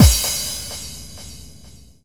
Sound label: BD-FX, Dance Mega Drums; Best Service